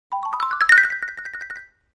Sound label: Xylophone Accent Shr